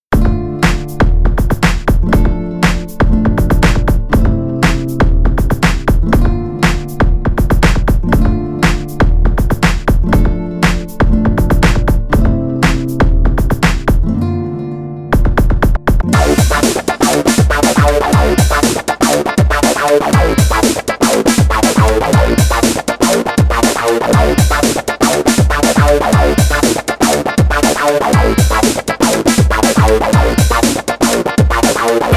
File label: Halion Sonic Guitar